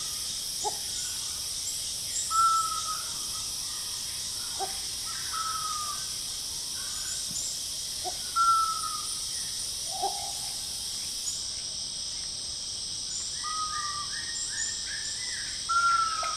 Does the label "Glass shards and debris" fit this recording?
No